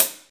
sparkle hat sound sample D key, foot hi hat sound tuned to the D note; hits one shots; hat samples